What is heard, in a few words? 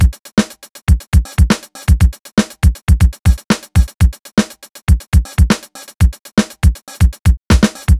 120 beat basic, Nu Jazz House; PowerFX